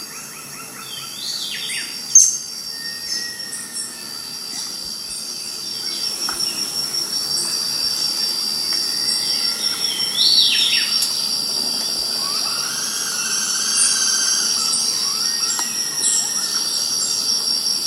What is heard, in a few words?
Woodpecker